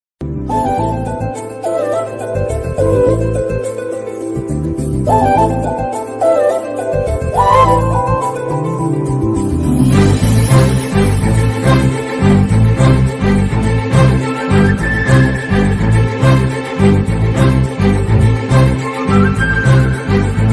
love bgm ringtone tamil ringtone new telugu ringtone music bgm; bommarillu movie